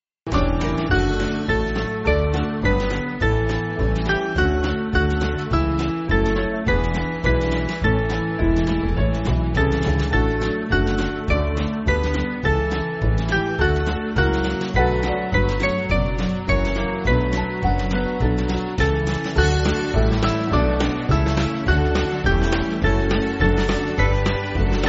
We Limit Not The Truth, Petersham, S Band

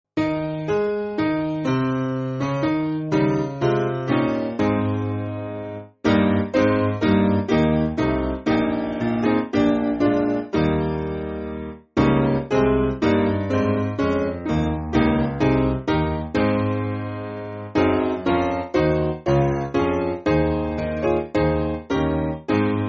Awake O Lord As Chilton Foliat SPiano; Small Band; 4/Ab

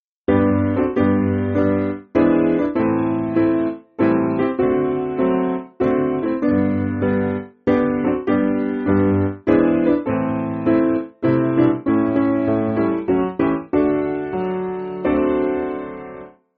Hymnary SPiano

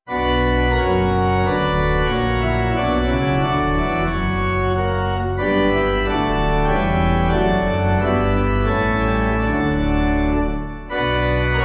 Pipe; All my hope on God is founded

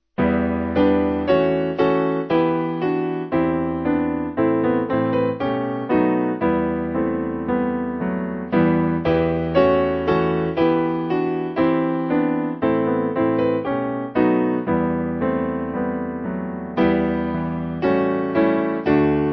piano

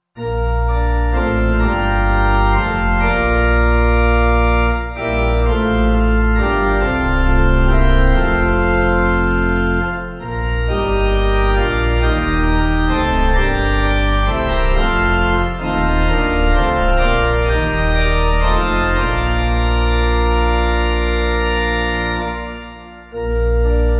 I Bless The Christ, Pipe; 6.6.8.6; Organ; 6/Bb; Shere

